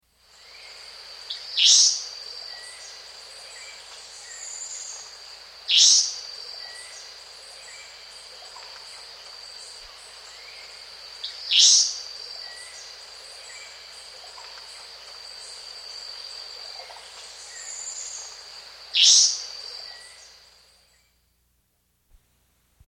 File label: White-Crowned Manakin; S. America